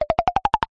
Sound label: cartoon ascend knocks wood delicate tip toes